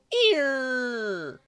beaver howl

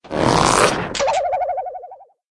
Mailbox full wobble

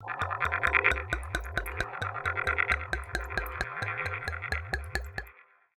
ink drain

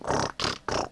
gator short

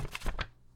Shuffle cards

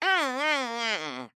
armadillo long

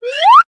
zap lightbulb appear

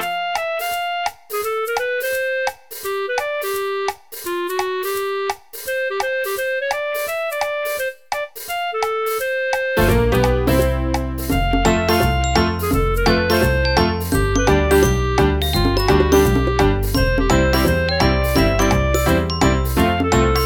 nbrhood

